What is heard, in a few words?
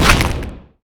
crab death; Risk of Rain